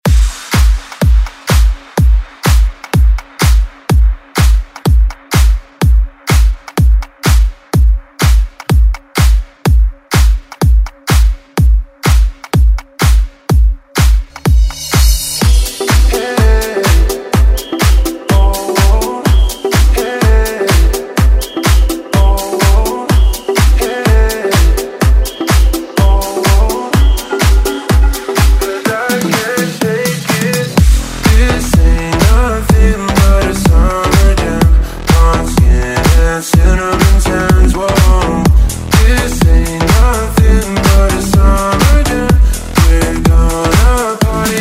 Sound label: Pop Dance